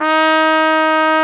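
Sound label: BRS AKG TP, trumpet AKG jazz; Roland L-CDX-03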